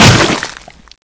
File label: chainsaw hit, weapons